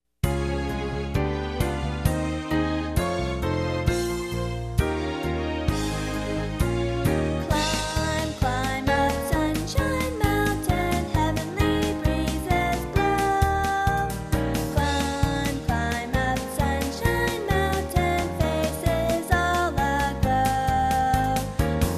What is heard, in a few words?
Kids